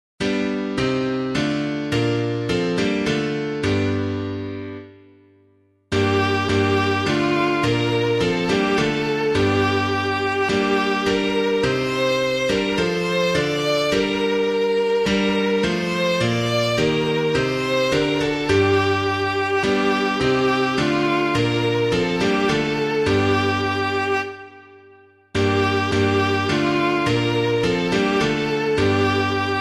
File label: Holy Spirit Lord of Love, piano